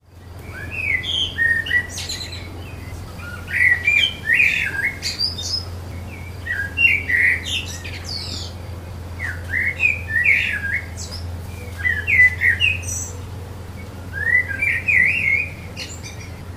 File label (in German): Amsel; Etosha